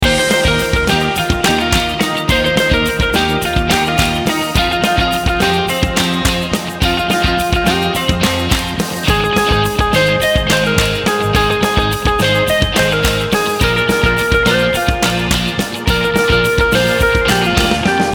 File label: Fun Indie Loop